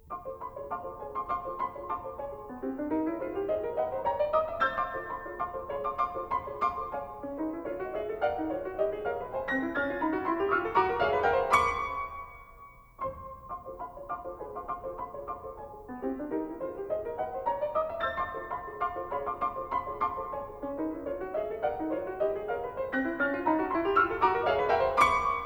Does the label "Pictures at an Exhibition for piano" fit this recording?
Yes